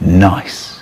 hitsound

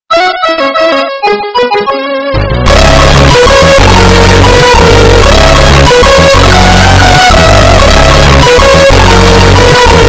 theme oops its loud; chicken invaders 2

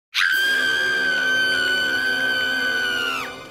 screaming; pinkie pie

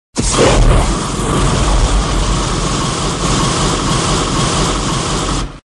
flamethrower sound effect

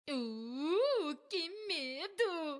uuh

